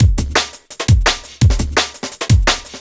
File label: Drum Loops, 170; RuffCollar; M8; Electronisounds